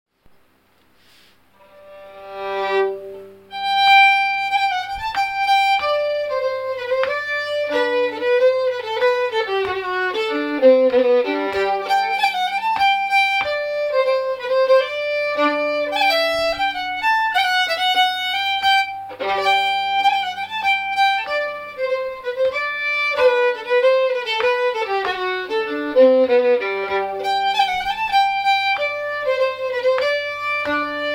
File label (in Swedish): Polska; efter Ludvig Olsson i Enshult